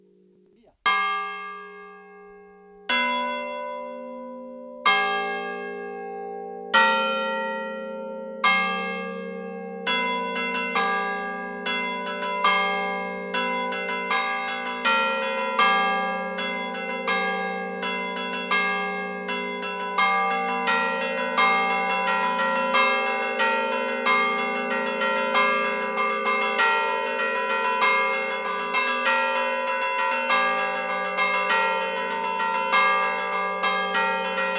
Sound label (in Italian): Campane; Lucinasco; Imperia; Liguria; Archivio Balma Campane Liguria; Polifonia